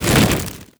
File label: buzz, antlion wings; creatures